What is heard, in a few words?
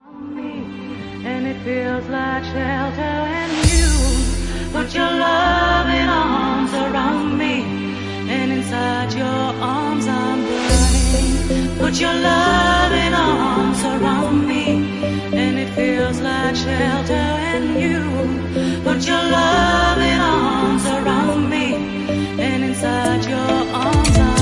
Organ Mix